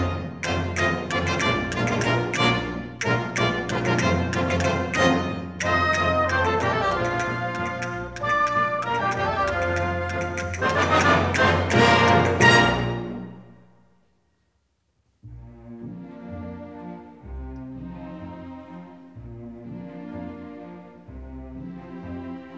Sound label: classical